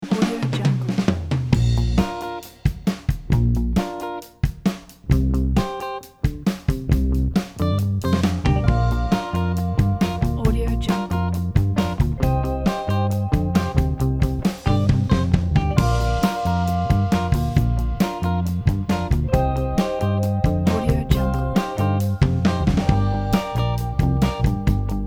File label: groovin on the beach